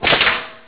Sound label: Small Impact; GLScene; AirBlast